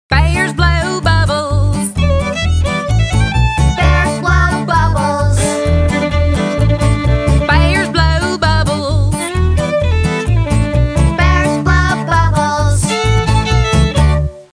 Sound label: Song Letters B; School; Fisher Price Ready for School Kindergarten